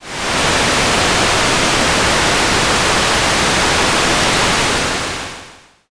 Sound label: rain